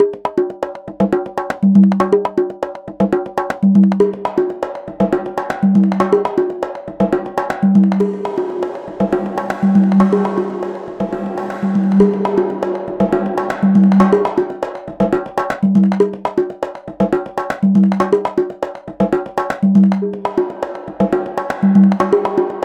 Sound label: Reverb; MASCHINE STUDIO